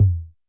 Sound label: Tom Analog Soft, YAMAHA RM1X